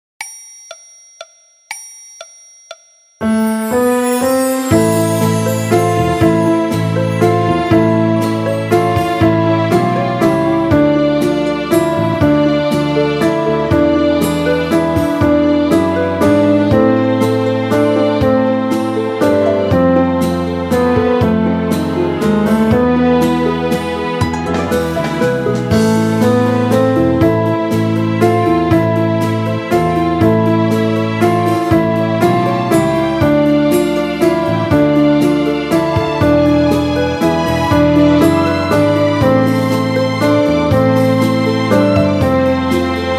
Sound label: Piano Orch